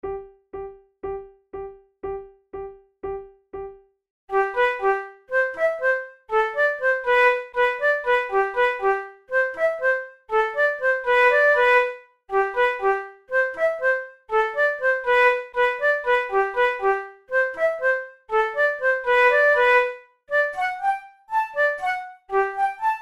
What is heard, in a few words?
pour la flute duos allegretto; devienne; nouvelle methode